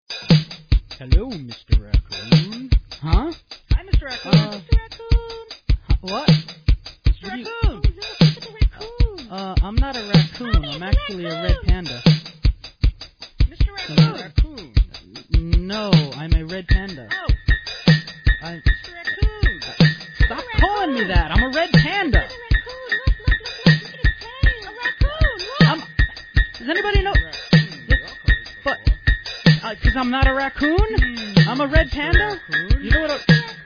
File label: Angry Wah